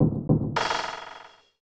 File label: Battle Intro; Shin Momotarou Densetsu; SNES